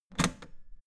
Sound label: old button heavy hard push